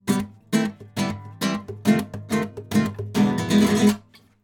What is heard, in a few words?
Strum Thump